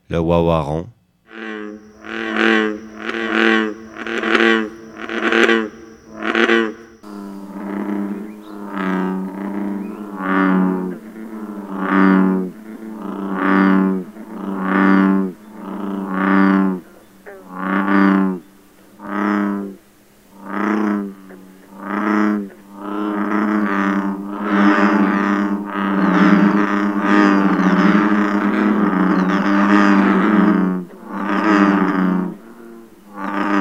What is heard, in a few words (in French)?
ouaouaron; grenouilles; anoures